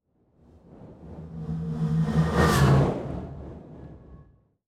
Scifi Whoosh Medium size vehicle tremolo engine medium speed; Dizzy Duck